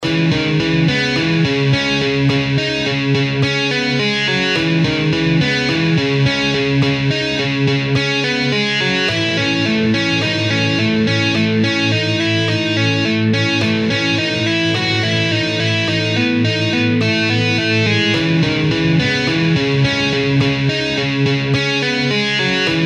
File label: Guitar Dynamics Half Speed